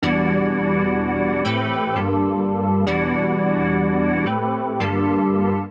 Glass Stab E Major 168 bpm; MIDI Clips; Tonal; Synth; Ableton; Skitter and Step

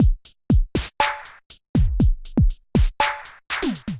Typhoon Kit, Drums Hybrid; 106 Typhoon Kit; Ableton; Factory Packs; Drum Essentials

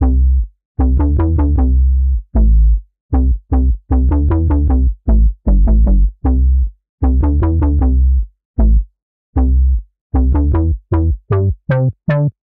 Tango Plush C Major 77 bpm, Bassline; Tonal; MIDI Clips; Drive and Glow; Ableton; Factory Packs

